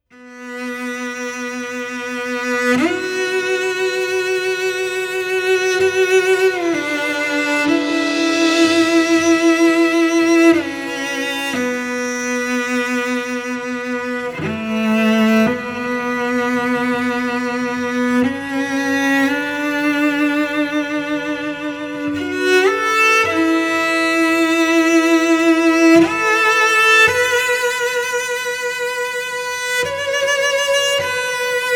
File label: Cello Overture; Might & Magic Heroes VI